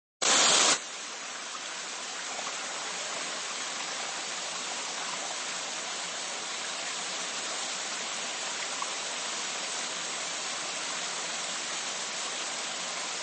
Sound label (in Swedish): Vattenfall